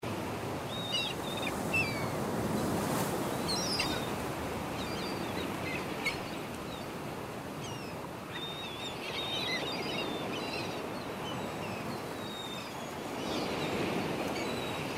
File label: Bonus BGM; slots; Miles And Millions